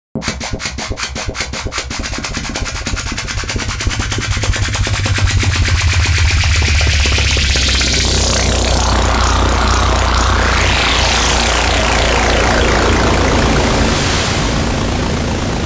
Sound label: Synplant 2 Amen Growth Sweep; Soniccharge